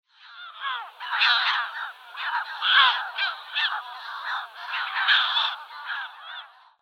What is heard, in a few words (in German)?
Korallenmoewe Larus audouinii; Vogelstimmen